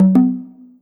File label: drum sound